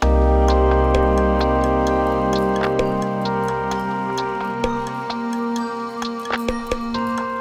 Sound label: Pads; BB 2HTC Samples Vol 4